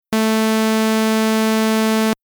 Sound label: Synth Additive Saw Only